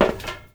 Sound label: Step Metal Iron